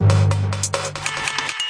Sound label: 140 bpm, offbeat echo whiner, drum loops and breaks